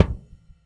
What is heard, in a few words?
Swinging, Dimensional RL Kicks; Airwindows